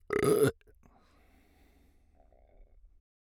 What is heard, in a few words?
burp; OPENAIR; Anechoic